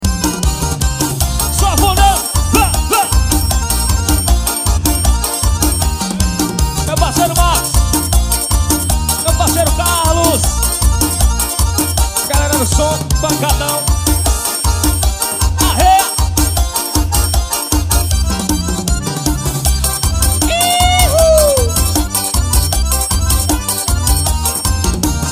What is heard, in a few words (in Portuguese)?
ao vivo forro